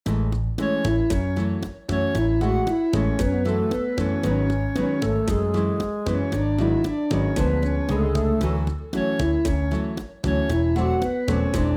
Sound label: Bossa Record; Sun Haven